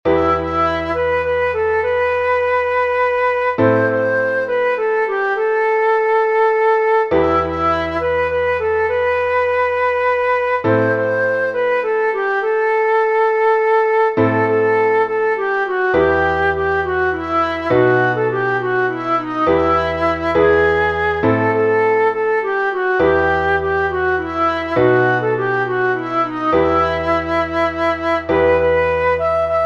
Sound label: Folk; Shekare Ahoo